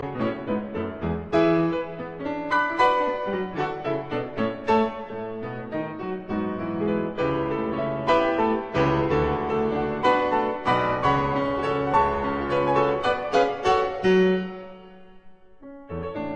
Piano Sonata in F sharp minor Scherzo Allegrissimo Intermezzo Lento; Piano Sonata No 1; Op 11